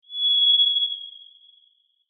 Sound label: bird whistle bird call